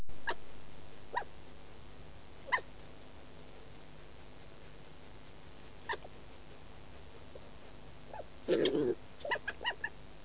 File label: chirp seq